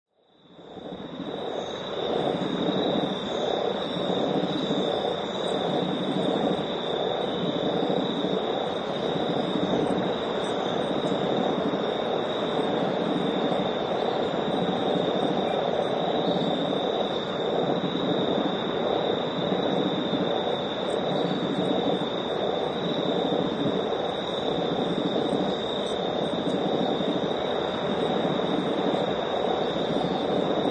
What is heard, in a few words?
Northern Marsh Sunset, A marsh / swamp in the far north of New Zealand, frog; Northern Marsh Sunset: A marsh / swamp in the far north of New Zealand featuring frogs and crickets.; Frog Sound Effects; Lynne Music